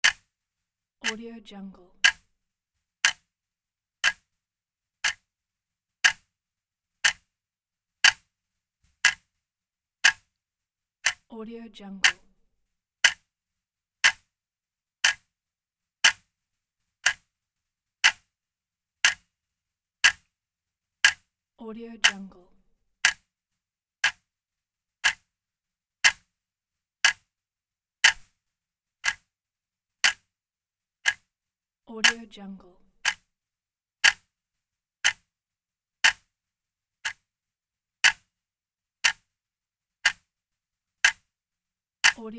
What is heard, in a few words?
clock ticking